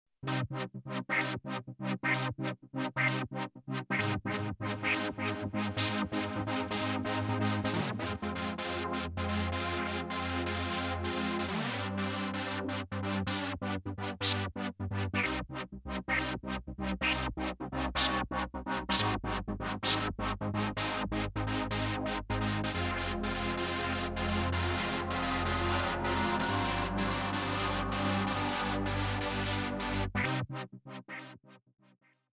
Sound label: Motion Filters